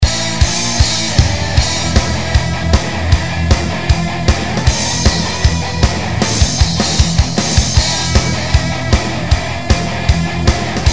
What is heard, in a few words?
Dramatic